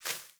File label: Sparrow Quick Move, Movements; Animals